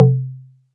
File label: Perc C3